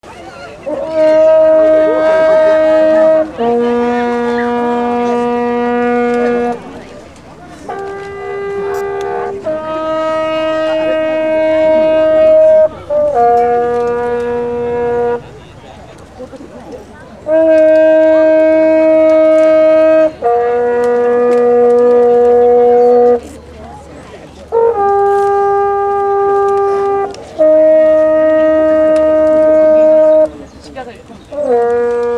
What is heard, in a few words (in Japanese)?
shika; soundscape; 奈良公園の鹿寄せというイベントの様子です。; 鹿愛護協会の人が、ホルンを吹くと、たくさんの鹿が集まってきます。; 集まった鹿がなく様は、こちらのページの「奈良の鹿」でも紹介しています。